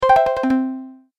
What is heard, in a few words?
Message Alert Sound, musical